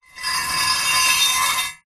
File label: industrial metal heavy gate post pounder scrape concrete ground